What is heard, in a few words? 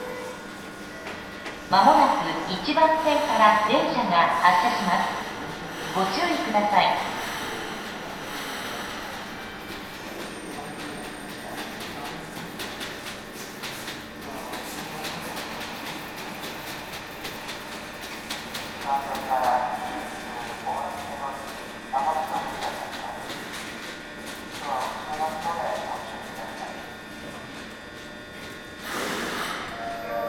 station